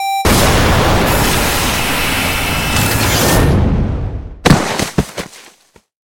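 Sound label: explosion of a space target hit by the enemy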